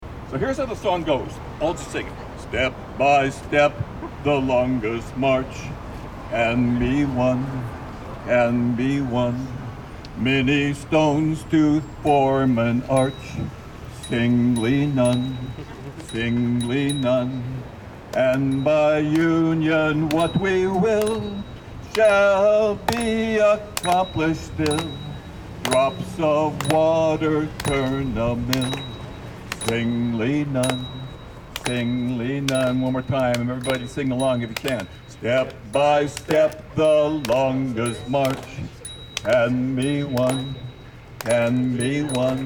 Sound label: song; bike town hall step by step